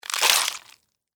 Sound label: Insect Squish, Guts, Footstep, On, Bug; Animal; Extermination; BlastWave